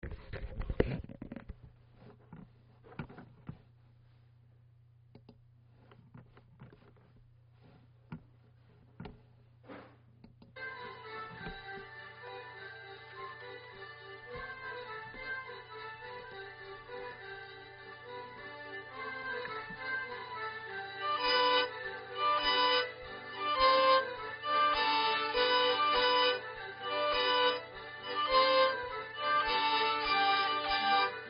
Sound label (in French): polka; paimpol